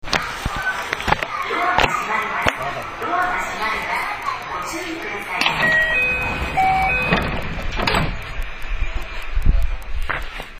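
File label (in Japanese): ドア閉め, 223系0番台; 阪和線の関空快速として投入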